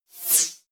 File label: sci-fi projectile fly by